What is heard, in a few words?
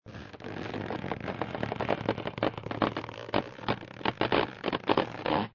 Corridor Move; MiSide